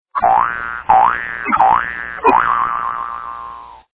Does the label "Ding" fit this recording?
Yes